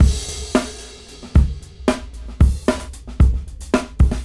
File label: Brown Dry 111 BPM; AMG - Keith LeBlanc Phat Butt Jams